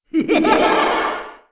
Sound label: scream